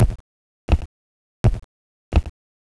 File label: footsteps